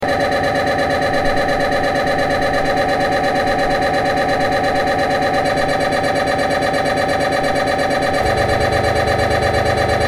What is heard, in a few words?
MRI SOUNDINGS